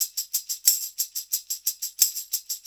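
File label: 90 TAMBOURINE, PERC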